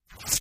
Heavy fabric is torn into strips. Tear, Fabric Rip, Fabric